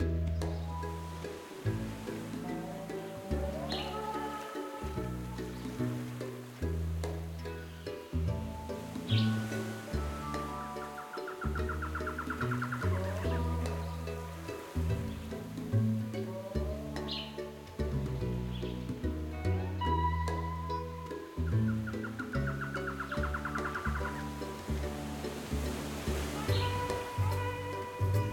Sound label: ambience General; aloha